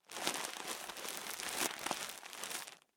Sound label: Food Bread Wrapper Close Twist Tie Loaf